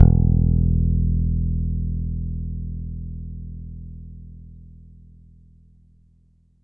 BASS ATTACK, BASS SYNTHES; Big Beat Samples & More

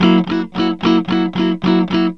ROCK, Vintage Blues Guitar; USB Soundscan vol.22; AKAI

